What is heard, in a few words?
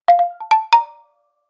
Oppo percussion tone ringtone